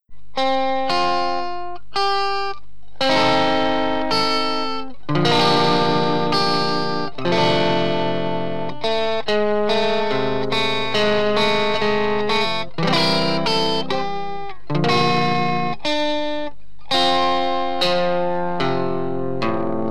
Thunder box; AKA Gelbart

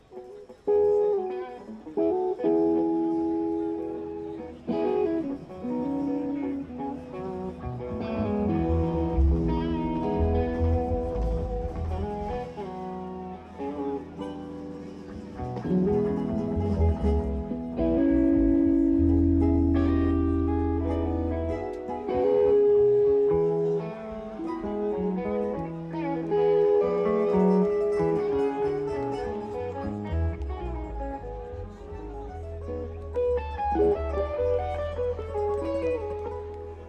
Tuning

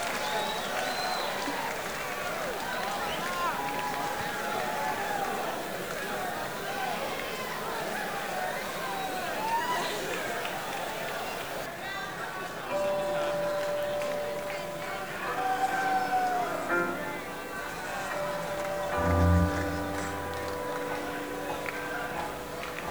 Crowd Tuning

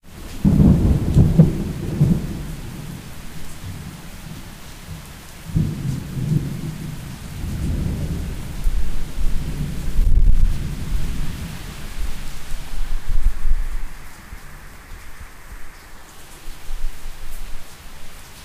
rain Krizevci; Sound Of Travel

